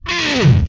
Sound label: Hit, Vocal; retro arcade